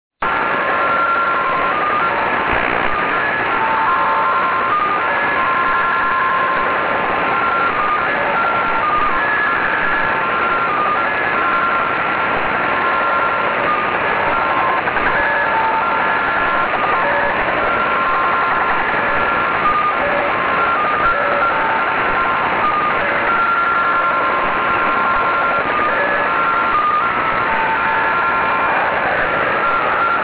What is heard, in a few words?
UNID 6418kHz